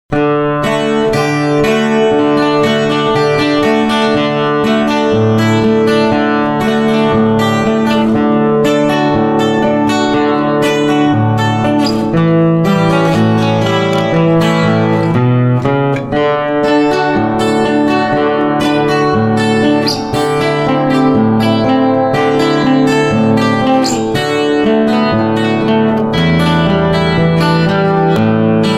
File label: new world rag, Gitarre; blues; ragtime